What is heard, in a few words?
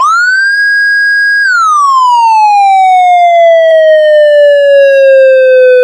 wail alternate, sirens, whelen 295